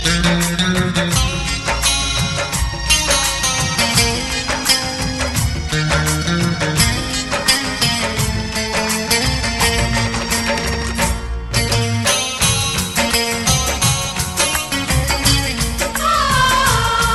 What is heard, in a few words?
Instrumental Song